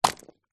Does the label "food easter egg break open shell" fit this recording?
Yes